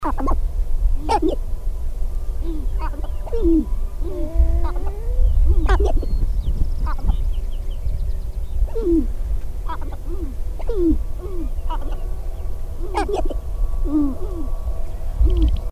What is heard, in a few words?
Sharp tailed Grouse